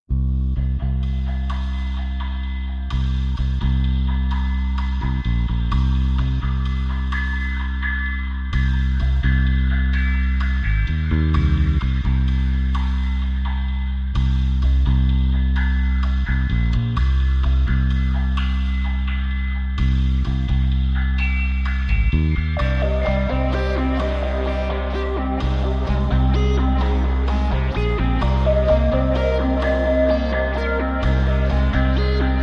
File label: Synths & Percussion & E Bass; Short